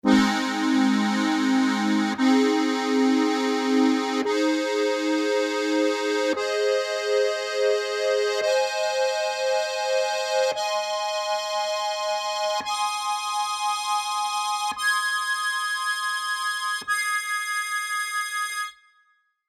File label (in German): B Es As Des Akkord, harmonika